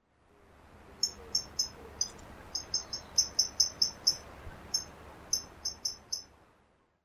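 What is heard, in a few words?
Pleske's Grasshopper Warbler; kashima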